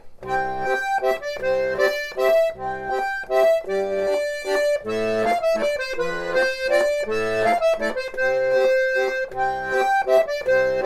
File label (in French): valse